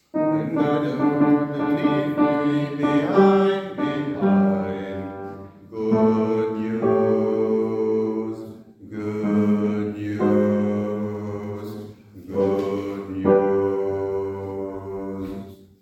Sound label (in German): Bass